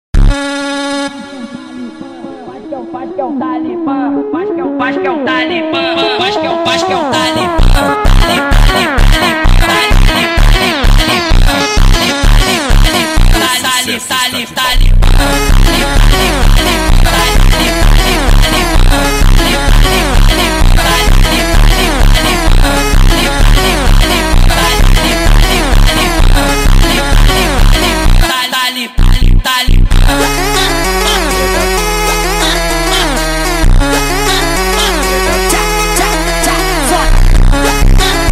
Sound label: slide funk